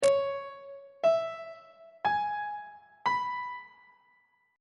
a flat harmonic minor 7th chord 4 is d flat minor 7th; on treble clef